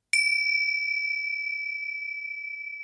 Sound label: Samsung Galaxy A13 Sms Ringtone